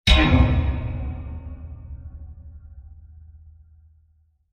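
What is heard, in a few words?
Digital Impact Blast; Impacts; Crafted Signals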